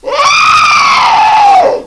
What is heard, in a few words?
pain